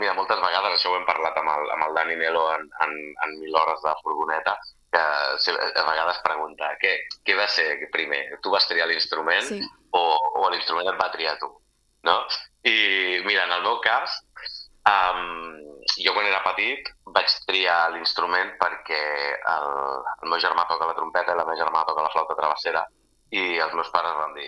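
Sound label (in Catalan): saxo baritono; estiu jazz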